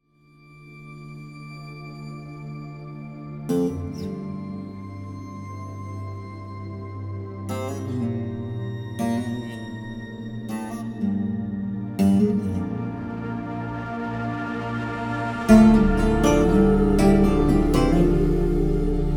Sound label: Strings Saz; World; Onwards; Evolution Series